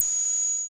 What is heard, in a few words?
HH, HH Synth